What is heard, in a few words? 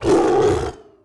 white lightning; FlyForInsanity